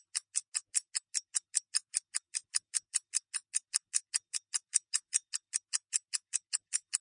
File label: count down